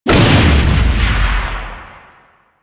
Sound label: Fists Heavy Punch Cast; Player Abilities